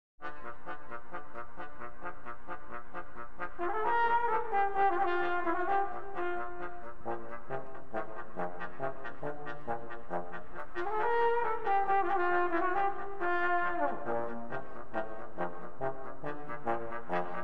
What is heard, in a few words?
Chinese Dance, Trombones